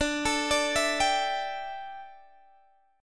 D Suspended 2nd Suspended 4th D down Guitar Open G